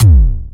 PERC FX

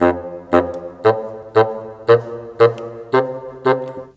Bassoon, Orchestral, Rock Pop

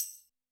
TAMBOURINE, Yamaha SY85 Drums

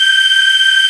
VOX, CRYSTAL SYN; AKAI S6000 CD-ROM - Volume 1; SYNTHESIZER

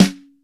snare, dry standard snare

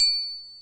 TRIANGLE

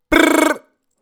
Brrrrrrrr

